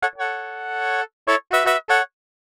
riff pop, brass; arturia

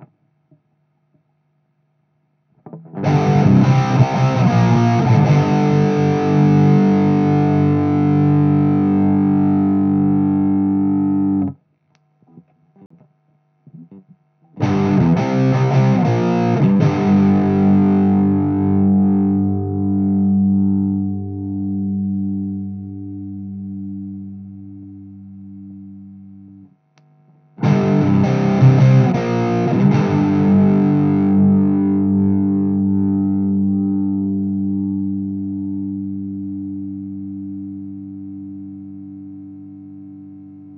s5470 disto sm57, ibanez prestige

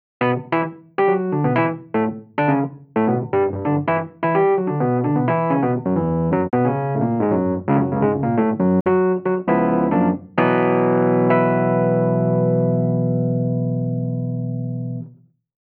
mk1 hard; digidesign velvet

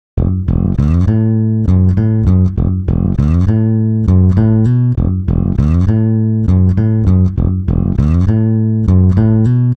bass; digidesign pro tools xform

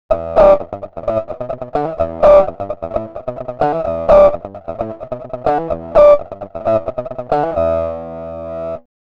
talkbox guitar; u-he uhbik

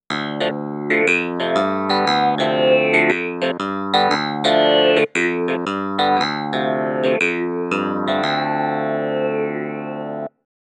M50 son clavinet; korg

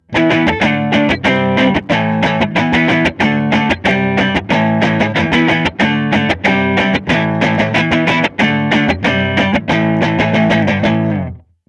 twang; line6; sortie pod

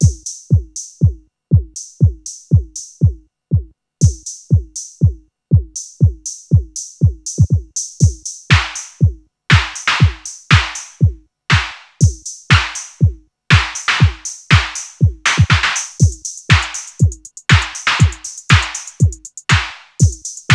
Waldorf Blofeld drums

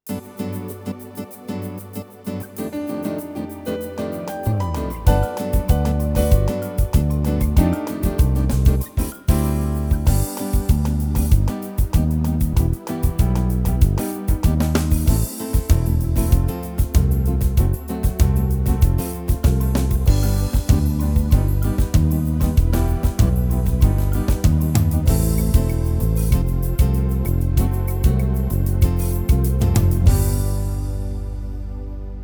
style latin brazilian samba, yamaha tyros3

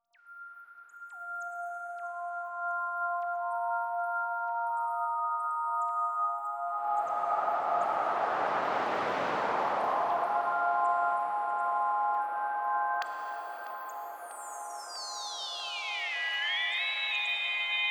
digipad bandpass autoreso; Clavia Nord Wave

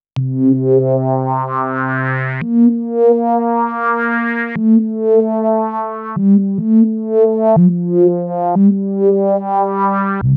reso filter flaw; davesmith mopho

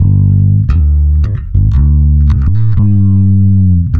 bass vari; steinberg cubase 5 variaudio

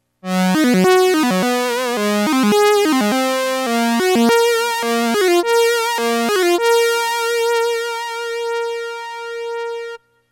trumpet chorus; gmedia oddity; ARP Odyssey

